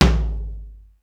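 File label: low floor dry, tom; sr16 tom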